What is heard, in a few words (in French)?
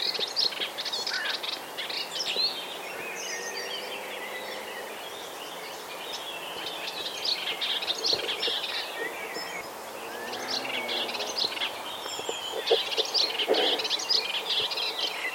hypolais polyglotte, friche; hypolais polyglotte friche ville evrar